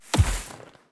npc tree golem big projectile

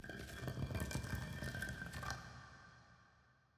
ambience room necromastery rock

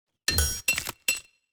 environment successful build stinger

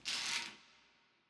ambience room torture cage

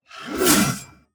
succubus strike; Shelter

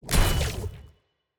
Orbital Dagger Hit; TANK; 3Souls

